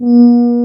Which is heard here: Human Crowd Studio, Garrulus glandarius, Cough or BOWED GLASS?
BOWED GLASS